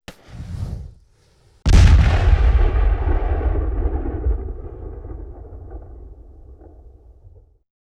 Cannon Light & Fire; Period Combat; Sony Pictures Sound Effects Series

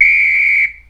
Referee Whistle, Sports & Recreation; Sony Pictures Sound Effects Series

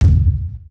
Claymore Mine Detonation; Weapons & Firearms; Sony Pictures Sound Effects Series

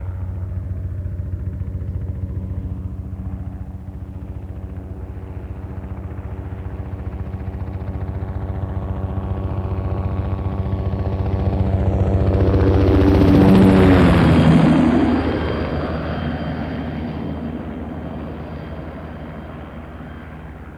military helicopter medium by; Sony Pictures Sound Effects Series; Vehicles

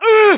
Male Scream

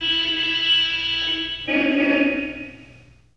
Car Horn; Sony Pictures Sound Effects Series; Period Vehicles

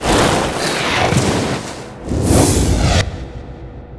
Mana Burn; RYL 2

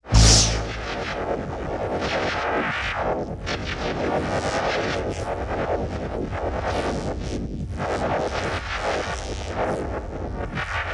Neutrino Wand On & Fire, Sound Design - Sci-Fi; Sony Pictures Sound Effects Series Volumes 1-10; Sony Creative Software

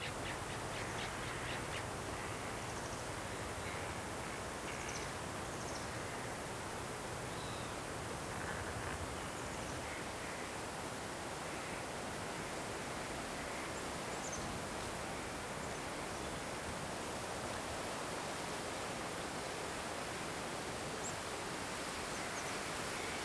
bird; RYL 1 v5.57

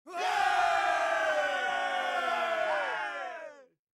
Cheer; Age of Empires III; cinematics

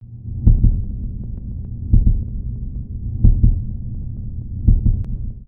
heart; RYL 2

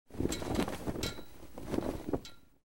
Flag; Age of Empires III; cinematics

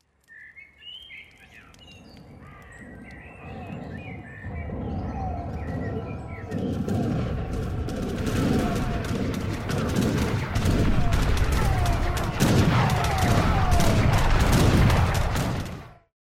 Battle; Microsoft Studios; Age of Empires III - Complete Collection; cinematics

